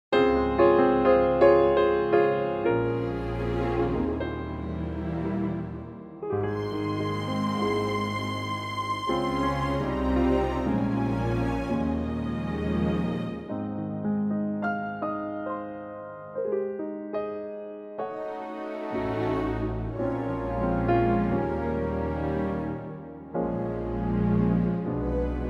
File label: Ab orchestra